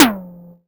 Synth Tom Hi, Percussion Hits

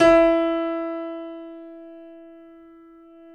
KEY E 3, YC7 Piano mf; Roland - Rhythm Section

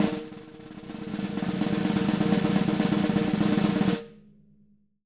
SN ROLL, SD ROLLS, Living Drums

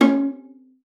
C#4, VIOLA FINGER; Xsample VOL-5

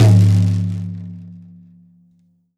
drm, WORLD DRUMS; World Instruments

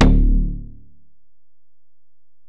Bell, Alesis DM-PRO

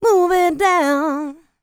LICKS, Vocal R&B Dance; LT DANCE

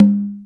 CONGA, DANZON; InVision Interactive; Percussion Slam

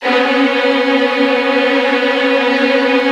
VN TRILLS, Virtuoso Strings

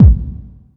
BD, B. DRUM, House Side Of 2 Step; USB Soundscan vol.51; AKAI

